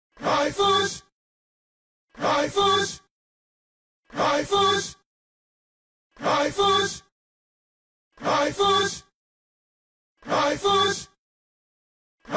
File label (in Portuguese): notificacao; deathmatch; zn celular